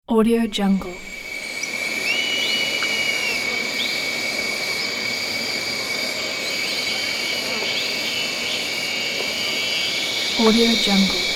night jungle

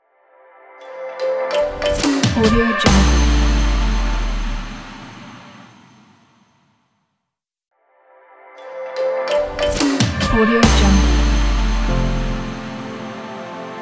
travel dubstep logo

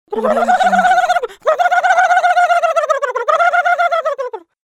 cartoon turkey panic

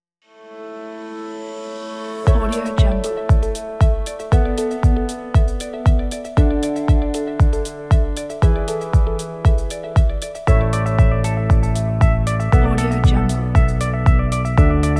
upbeat corporate energy

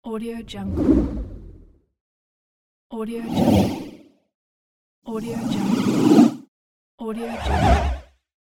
scifi warp whoosh

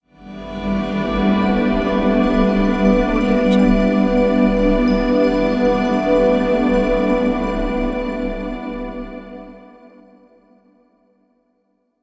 elemental good high shimmering pad; soundmorph

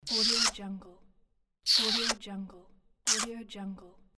female kissing

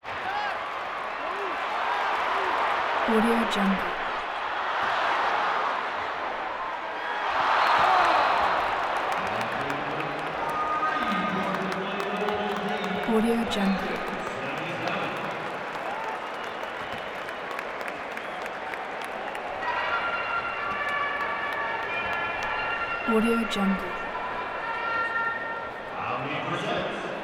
basketball game crowd idle busy walla; prosoundfx

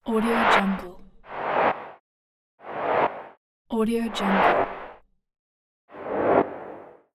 swish pack

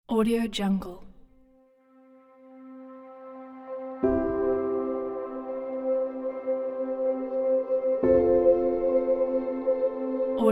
background nature piano

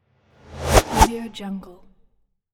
whoosh transition

